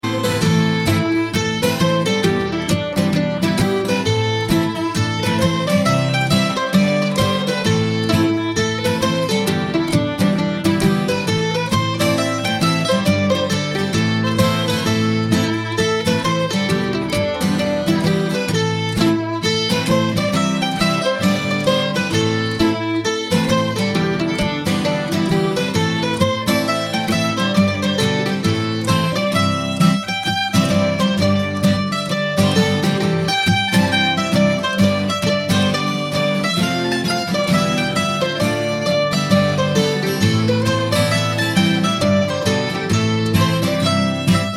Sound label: Hornpipe